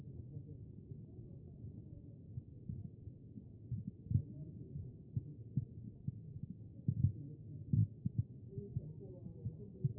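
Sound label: anterior chest, position 4; B MODE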